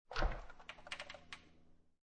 Refrigerator Door Open, Household, Refrigerator, Door Open, Slightly Squeaky; Kitchen Sound Effects